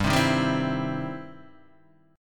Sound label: E Minor Major 7th Sharp 5th G